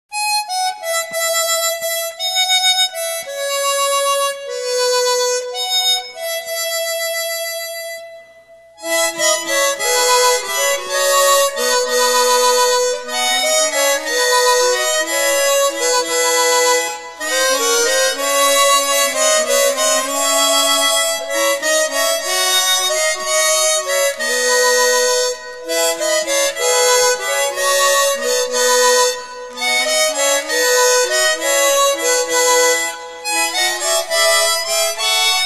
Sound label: Chromatic Harmonica; Open Thine Eyes That I May See